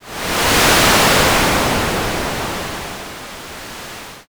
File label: wind